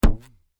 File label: Cartoon Rubber Band Stretch Twhap; Miscellaneous Cartoon